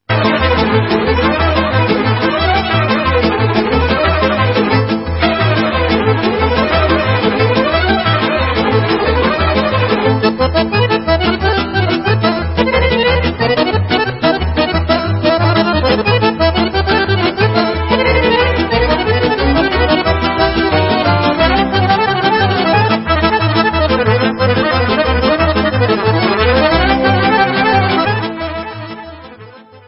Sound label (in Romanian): Chiorba Romanit Batuta la Acordeon, Instrumental; Ilan Swisa